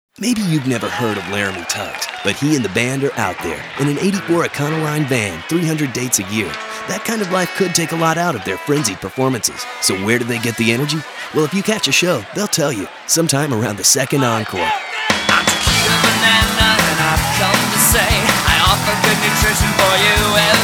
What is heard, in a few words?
rock